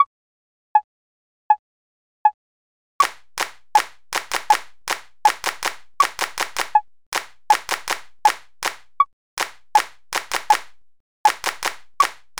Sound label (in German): sechzehntel uebung 80, rhythmik